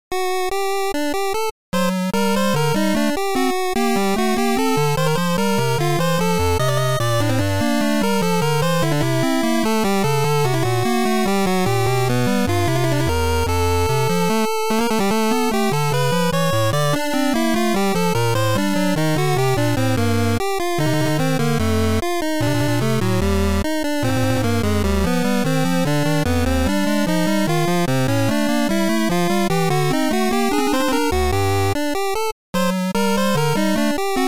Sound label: 8 bit invention